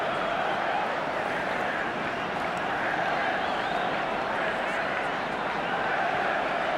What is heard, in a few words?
ambience level; netent